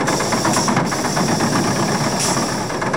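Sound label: machinery out loop; Garry's Mod; tf2; cp harbor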